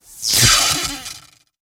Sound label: Elect Arc Scratchy, arcs & sparks